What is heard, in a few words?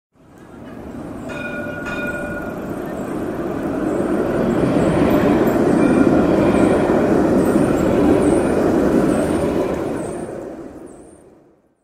tram crossing